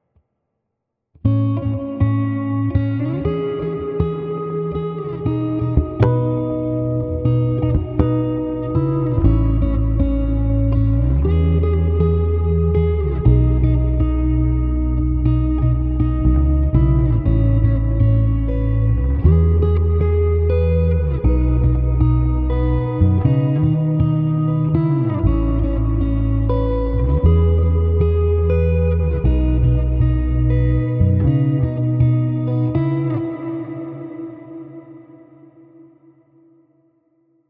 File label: Pop